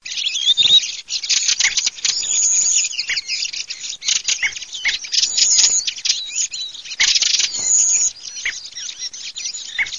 SASSELLO

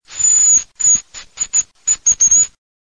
FRANCOLINO

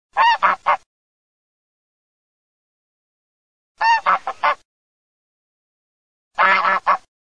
OCA SELAVATICA

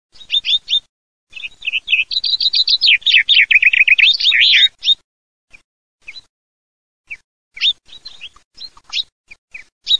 FRINGUELLO

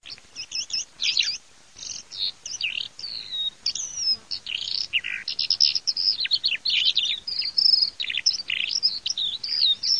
ALLODOLE

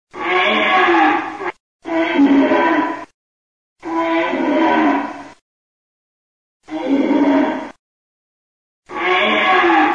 CERVO

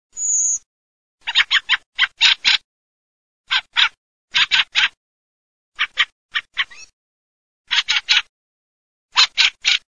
CESENA SASSELLO

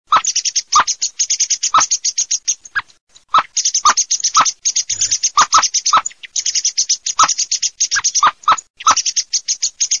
SCHIAMAZZO MERLO BIANCO

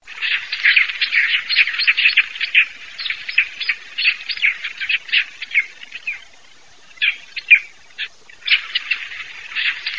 PERNICE ROSSA, birdsound

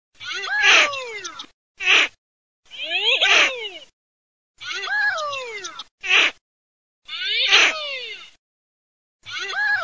MORIGLIONI

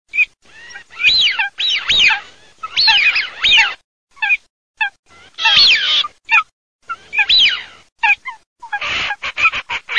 ALZAVOLA FISCHIONE FOLAGA

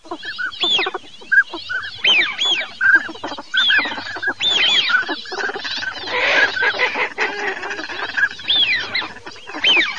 FISCHIONE CODONE

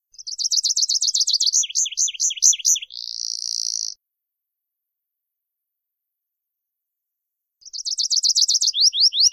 Prispolone

